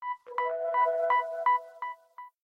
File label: Meteor, phonetones Message tones; GD550 Pure